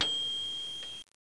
kling; 22,050 sample rate

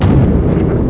gun

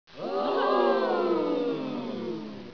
wrong; Workplace Effectiveness: Decision-Making Strategies

